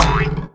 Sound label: Lion Mask Die